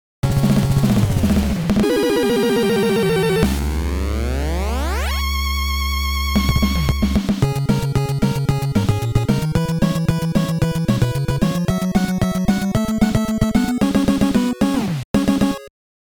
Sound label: Game Opening; the cowabunga collection